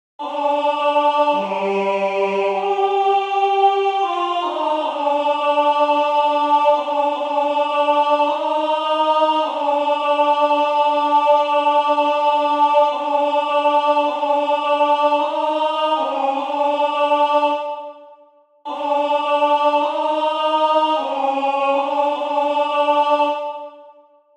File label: Alto; Pie Jesu; chorale